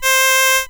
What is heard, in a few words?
Casio Violin C5